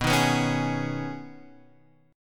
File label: B 6th Add 9th B down Guitar Half Step Down